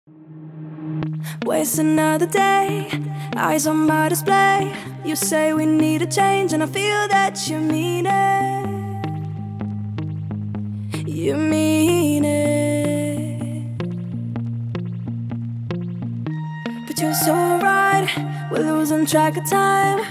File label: house; ncs copyright free music